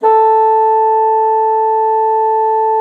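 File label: bassoon piano A4; Xsample VOL-6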